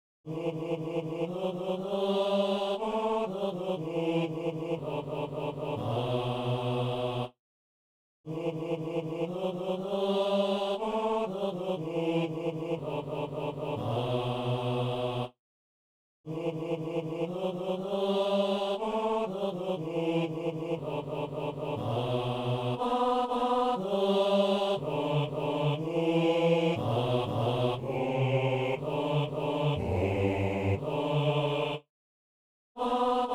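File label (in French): fais paraître ton jour, basse; Saint-Denis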